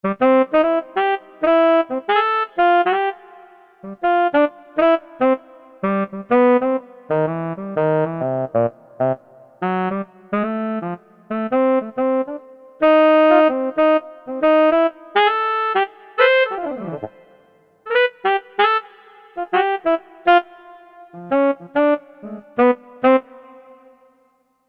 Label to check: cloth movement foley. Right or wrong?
Wrong